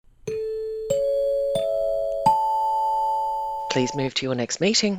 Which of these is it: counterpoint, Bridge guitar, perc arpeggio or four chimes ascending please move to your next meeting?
four chimes ascending please move to your next meeting